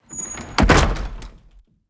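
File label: wood double light close, door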